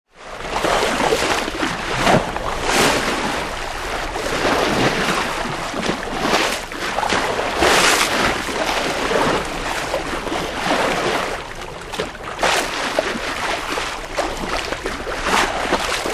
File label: Galleon Tall Ship Under Sail, Stern wash; Boats; Vehicles; Tom Hutchings